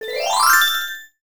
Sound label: template stars; DTL Proto4